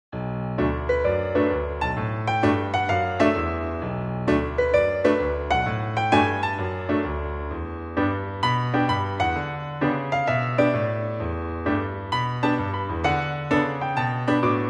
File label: Headquarters Piano; MySims Agents